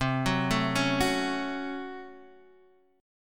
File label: C sharp Major 7th Suspended 4th Sharp 5th, C